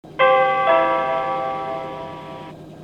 chime2; hiroden

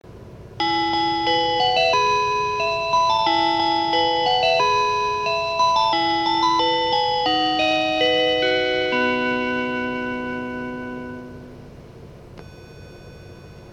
485 kirakira uetsu chime; higashinihon

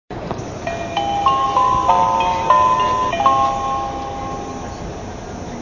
nishitetsu chime

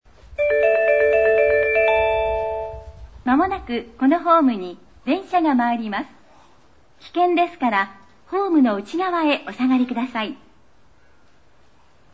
Osaka loop line Sakuranomiya the inner track stop; nishinihon

